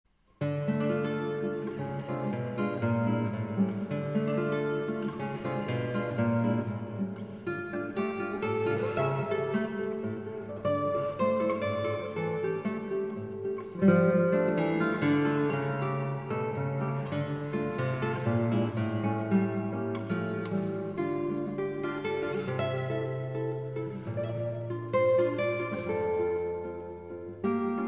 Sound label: Spanish Song, guitar